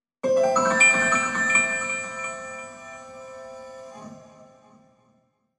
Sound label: Jingle Piano